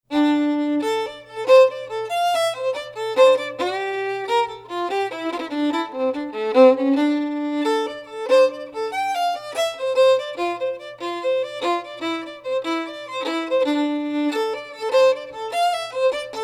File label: Slow, Fiddler